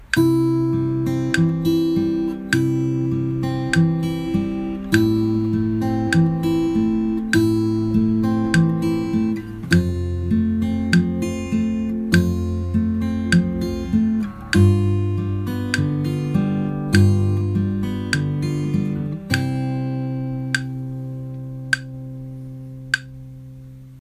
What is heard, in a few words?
arp